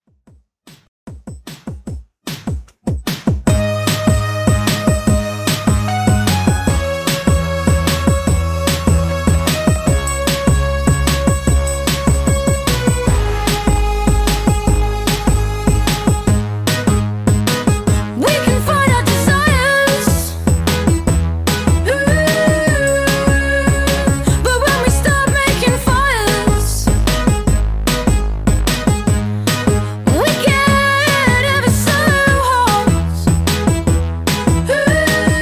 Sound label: Dj Intro Outro 150Bpm